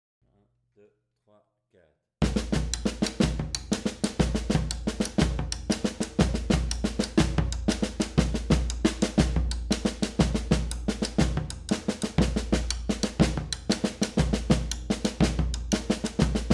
Afro Ternaire 3x3, Batucada

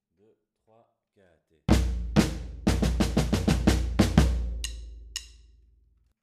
Interlude Ternaire, Batucada

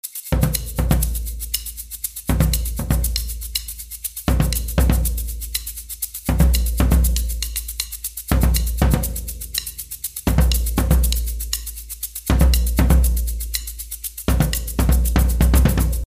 Afro Cubain Bass, Batucada

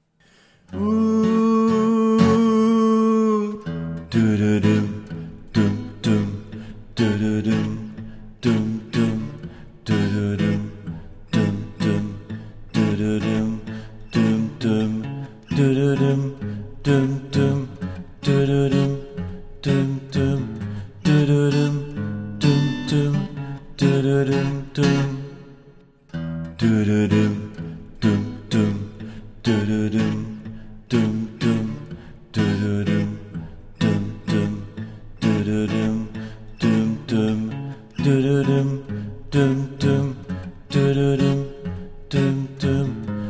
Voix Rythm; Porceaugnac

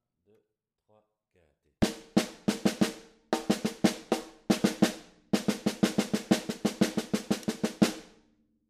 Coda Ternaire Snare, Batucada

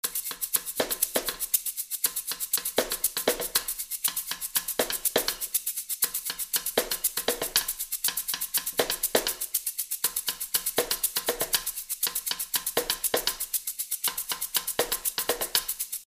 Afro Cubain Snare, Batucada

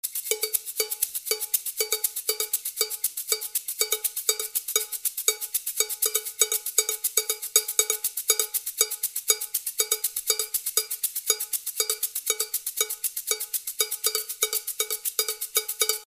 Afro Cubain Cow Bell, Batucada

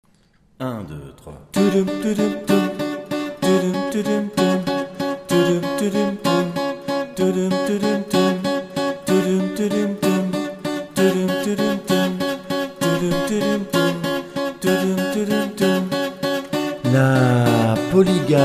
Voix Lead; Porceaugnac

